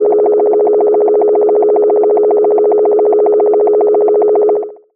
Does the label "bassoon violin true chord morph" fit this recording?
No